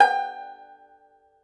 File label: Tenor Banjo, Plucked Strings